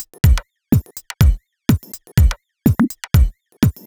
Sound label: Top 124bpm, House Drumloops; Zero-G House Fabrik Drumloops